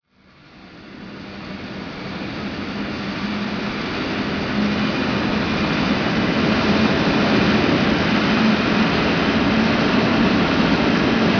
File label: gunship distant, streetwar; ambient; source engine; hl2; video games